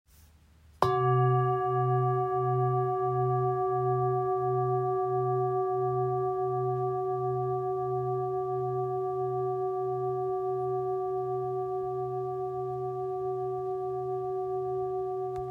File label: Polished bowl, singing bowl; Polished Harmony Bowls; 27cm